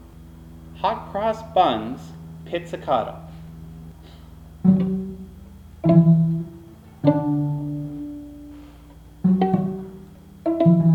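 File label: Hot Cross Buns, Strings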